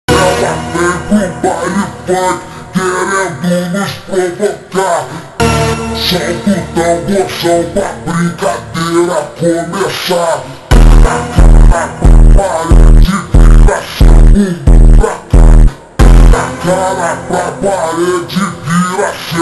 super slowed reverb brazilian phonk

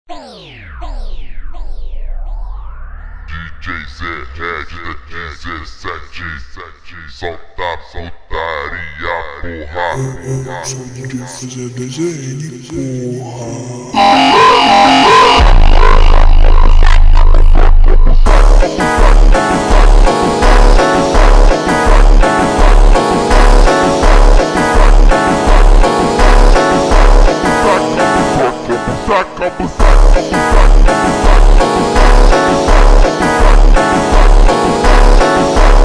montagem super slowed reverb

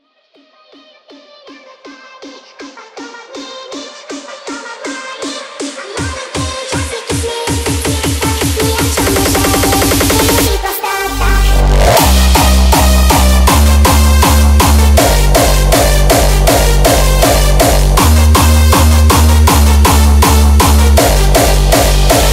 hardstyle remix

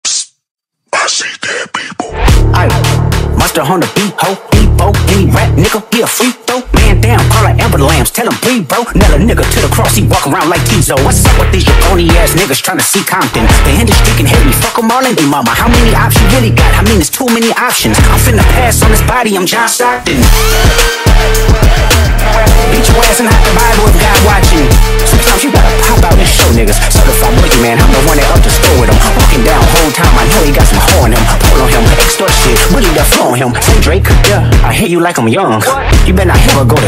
Brazilian phonk remix

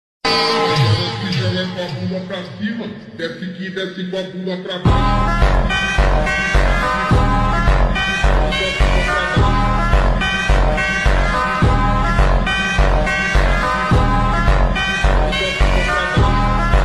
funk slowed reverb

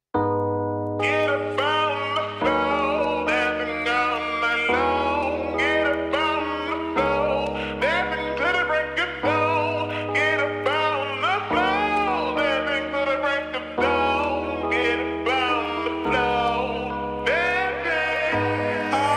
remix slowed reverb

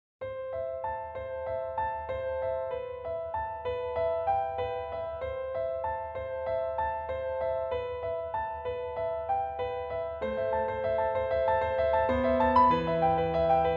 piano solo

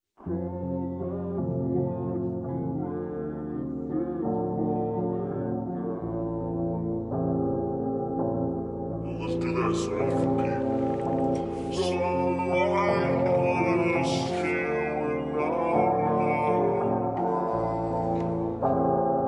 slowed reverb 432hz

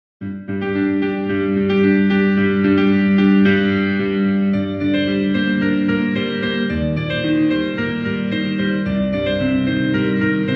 piano karaoke; НОТЫ & MIDI

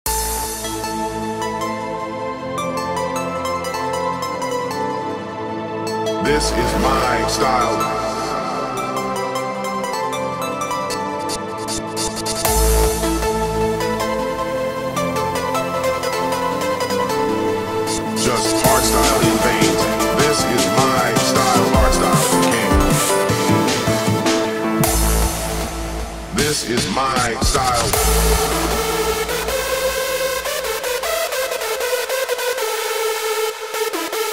hardstyle

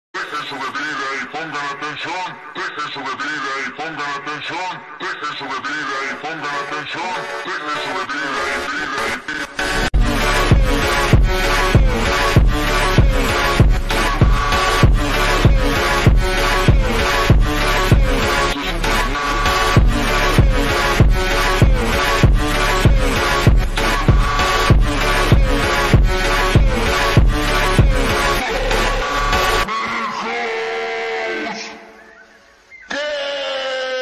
funk slowed reverb

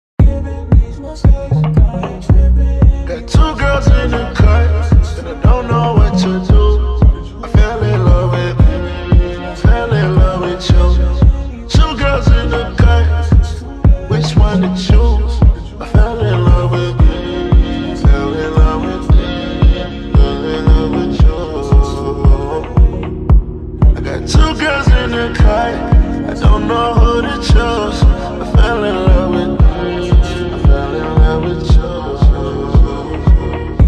looped slowed reverb

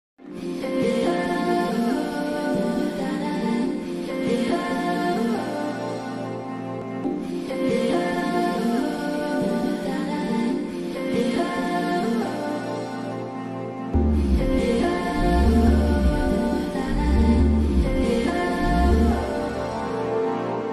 remix slowed reverb

